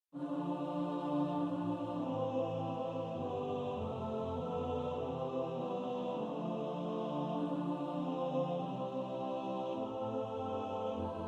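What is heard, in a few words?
What wondrous love is this alto, choir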